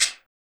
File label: RSS PERC